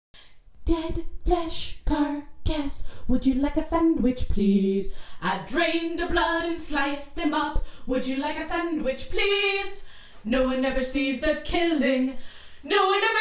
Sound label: Vocal Stem; Dead Flesh